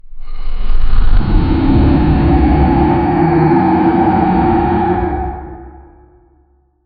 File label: thunderous roar demonic; abyss